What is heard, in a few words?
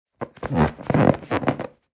Inflatable toy creaking surface squeak